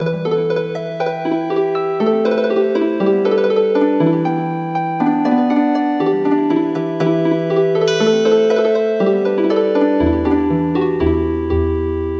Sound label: Healin Harp